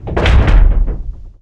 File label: collision; Starsiege